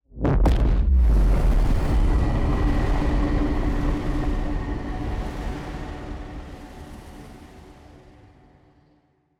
rift open; astral planes

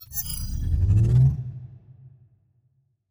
cloaking activation button; Stellaris; first contact

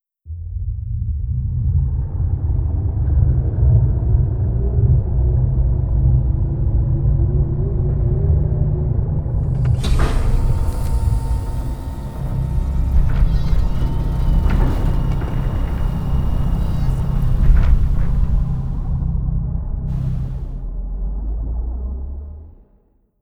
humanoid colossus opening; apocalypse

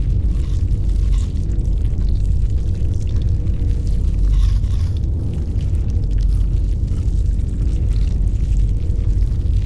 ant ship colossus idle; biogenesis

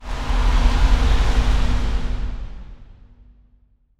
continental planet; ambient; Stellaris

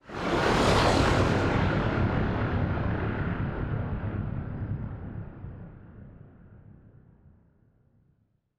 ship battleship move; Stellaris; machineage

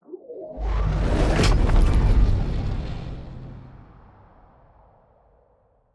ship destroyer move; Stellaris; shroud; ships

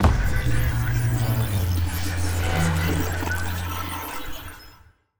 ui spy network button; Stellaris; first contact

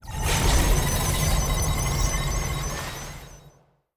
habitat amb; Stellaris; utopia

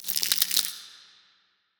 small leg up; biogenesis

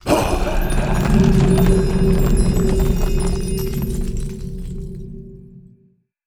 lithoid angelic; lithoid portrait; Stellaris

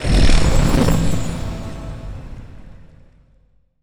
necroid moving out construction; Stellaris; necroids

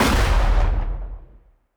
lightning muzzle lightning crack, weapons; kaiju; Stellaris; biogenesis

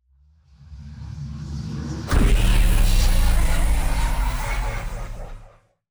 whisperers in the void; Stellaris; shroud

